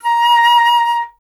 FLT FLTA#, Flute; Miroslav Vitous - Solo Instruments VOL-2

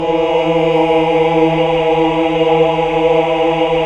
HAL AAH. AAAH Uni

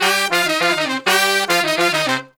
Horn Section; Sonic Foundry (Sony Creative Software); Jazz Solos and Sections

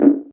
Percussion, House; Best Service; House Definition VOL-1; Drums

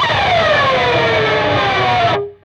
PRS SCRAP, Guitars; PRS SCRAPES

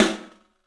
STEEL S, drums; 3D Sound

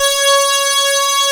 OB KNIFE C#5, OB Synths, Analog; E-MU Formula 4000 Series Vol. 3; Analog Odyssey

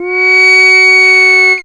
fx F#, Analogue FX, Dance Synths; Samples vol.37 - Dance Synths

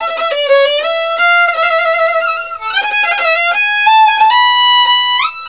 VIOLIN, Balkanish Traditions

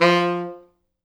F2, Pop Brass; Best Service ProSamples vol.19; AKAI

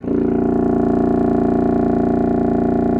BASSOON A#0; Xsample VOL-6; C BASSOON

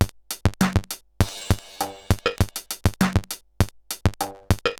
Bent RX17 (100BPM), cyborg beats; musicradar

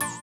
VIBRA; Spectrasonics; Metamorphosis

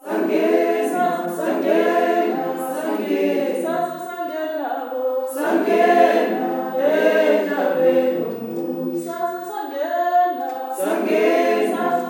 SWAY CHR, FEM. CHOIR; Spectrasonics; Heart of Africa 2

